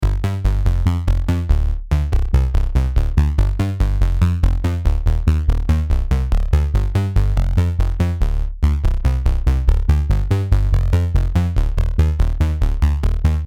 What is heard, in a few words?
Bass